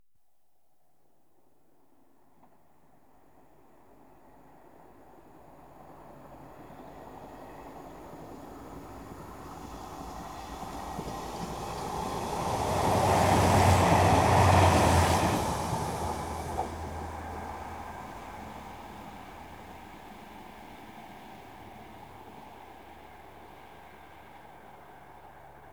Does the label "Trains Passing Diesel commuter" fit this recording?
Yes